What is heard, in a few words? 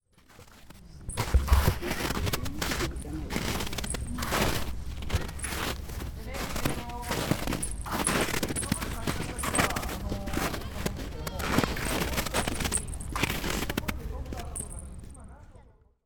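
yuki no ue wo aruku, walking on snow; 雪の上を歩く; たまちゃん雪の上を歩くの巻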